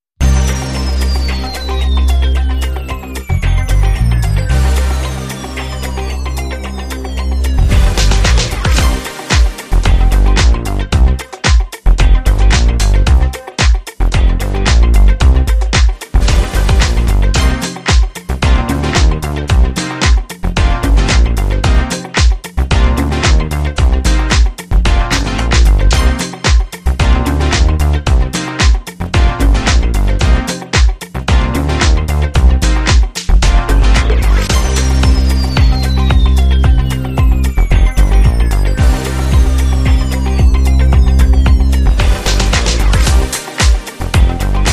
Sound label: Funk Like This